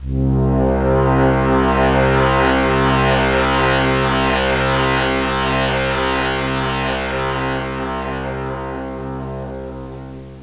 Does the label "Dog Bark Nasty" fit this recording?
No